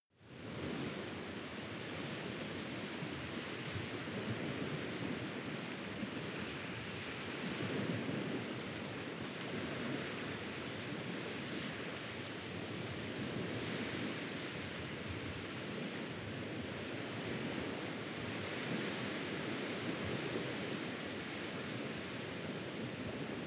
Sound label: beach waves rumbling wind